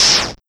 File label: Noise Hit